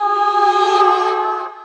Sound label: Magic ICEWAIL